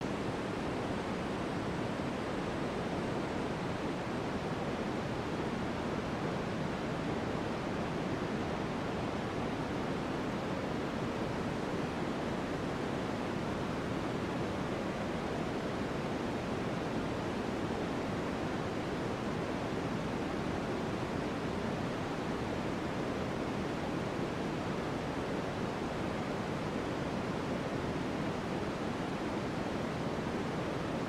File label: pause loop